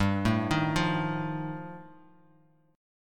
G 7th Suspended 2nd Sharp 5th G, Bass Standard; arpeggiated; JGuitar

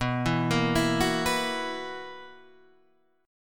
B Major 7th Suspended 4th, guitar chord; arpeggiated; 7,7,8,9,7,7; JGuitar

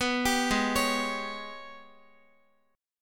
G sharp Minor Major 11th, Guitar; arpeggiated; JGuitar

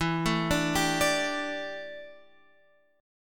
D Suspended 2nd Suspended 4th E

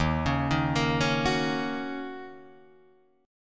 Eb Minor 7th Guitar Drop D, chord; arpeggiated

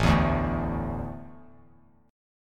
A Suspended 4th, Bass Standard, Asus4 chord; Asus4 strummed; JGuitar

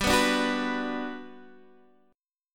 G Major 7th G down Guitar Half Step Down

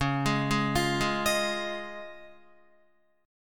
Db Major Add 9th, Guitar, chord; arpeggiated; JGuitar

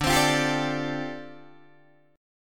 D Minor 11th D down Guitar Open D

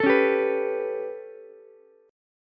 B 7th Suspended 2nd Sharp 5th down Ukulele, chord; B7sus2#5 strummed; JGuitar

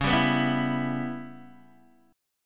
Db Minor 7th Flat 5th Db down Guitar DADGAD

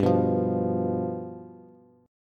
G 7th G down Bass; G7 strummed; JGuitar

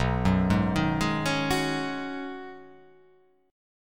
B 6th Add 9th B down, chord

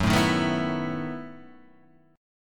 E Major 7th Suspended 2nd Suspended 4th Gb

